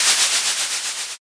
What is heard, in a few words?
eraser